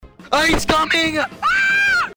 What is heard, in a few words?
ahhhhh